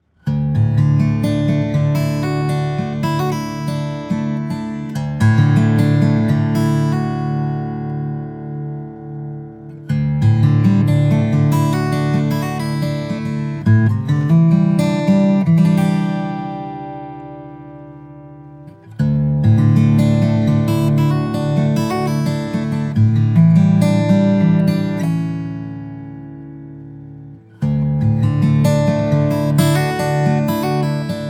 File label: Guitar Sample 12a, Rosewoods